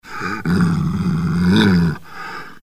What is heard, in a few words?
dog; samsung-inside-job